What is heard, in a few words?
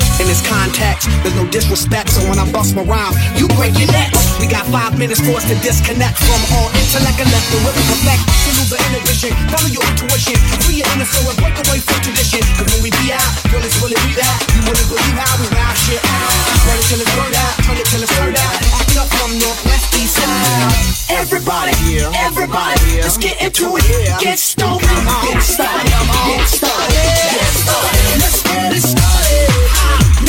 116bpm Bm Slam End